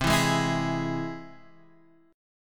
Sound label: C Augmented, Guitar Standard, chord; strummed; 8,7,6,9,9,x; JGuitar